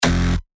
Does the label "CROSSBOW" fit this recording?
No